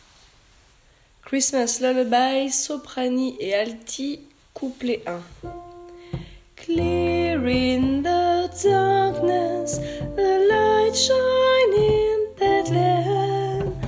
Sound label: Alto Soprano; Christmas Couplet 1